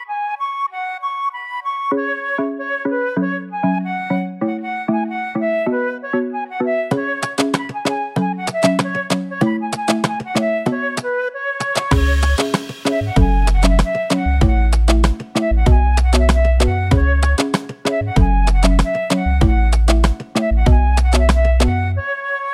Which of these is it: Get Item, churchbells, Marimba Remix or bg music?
Marimba Remix